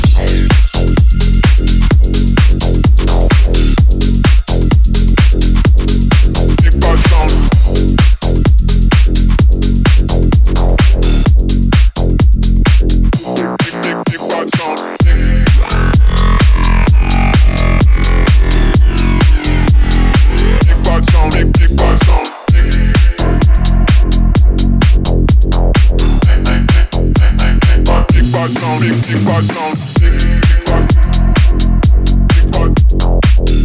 extended mix, bass house; yearmix 2025

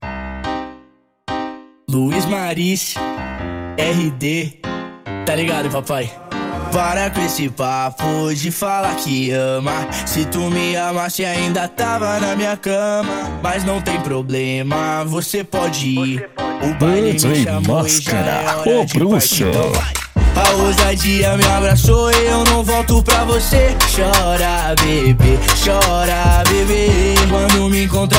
cd funk

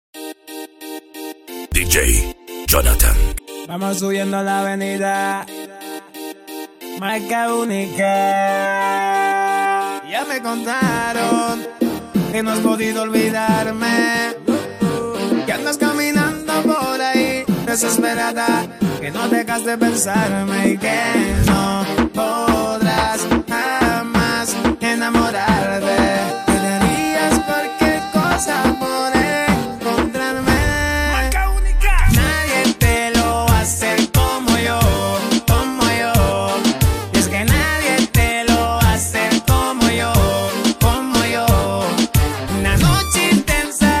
reggaeton mix; reggaeton mix evolution

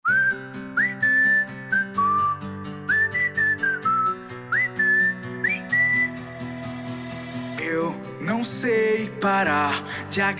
remix gospel

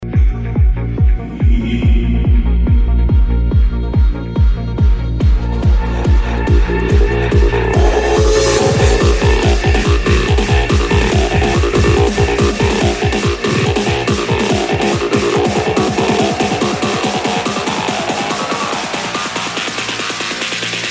deep house

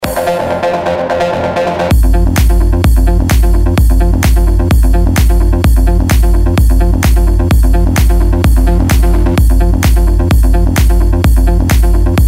electro house

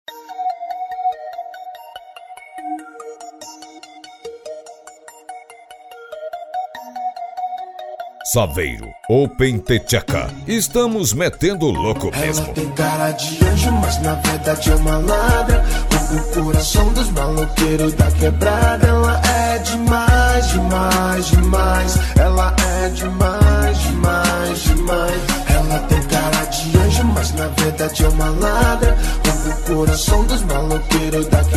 bass; saveiro open the theca

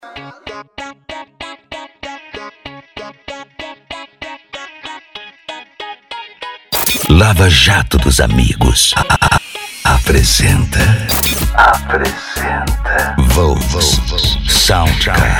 sound car reggaeton; cd volks sound car

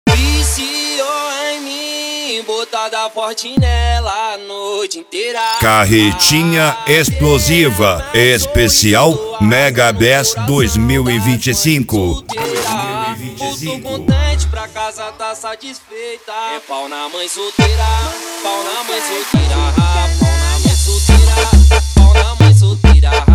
mega bass

